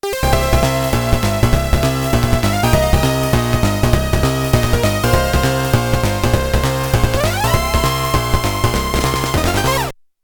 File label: SCC Stereo; F1 Spirit OST; Audio FlashJacks